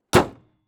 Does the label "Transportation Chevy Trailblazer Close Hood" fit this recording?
Yes